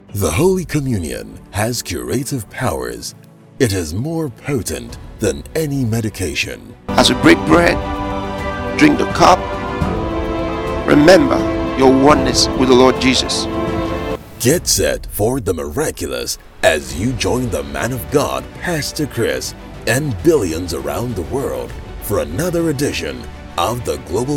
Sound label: JINGLES